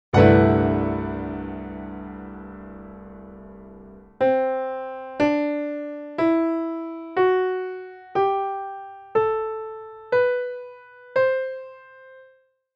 Lydian Scale in Fifths and Steps; Ladder of Fifths and Step-wise

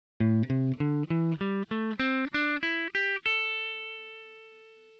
minor pentatonic

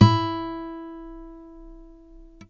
high e reference note

